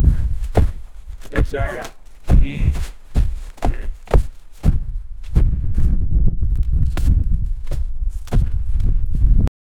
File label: fat guy walking in hiking